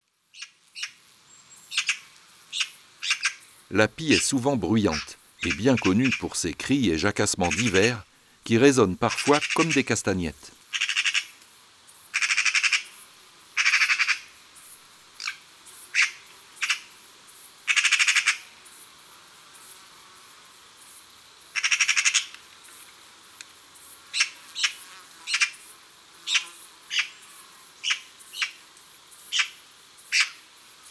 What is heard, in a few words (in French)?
Pie bavarde